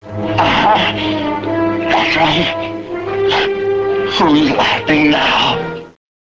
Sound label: laughing